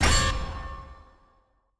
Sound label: countdown begin